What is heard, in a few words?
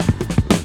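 drum roll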